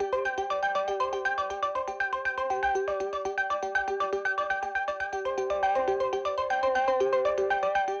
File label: Reel spin; netent